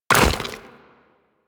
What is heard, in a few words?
Step Goo, Footsteps Walk; DE; Warframe; Tenno